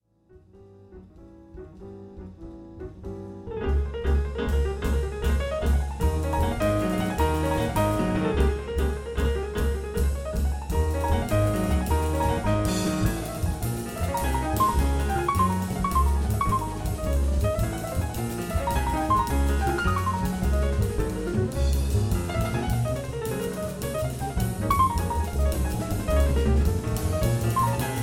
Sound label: Waltz In D Flat Major